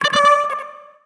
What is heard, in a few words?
Get Fanfare